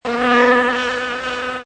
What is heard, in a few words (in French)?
Cri; MOBICLIC 65